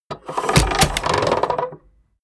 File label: mechanics small rattle clatter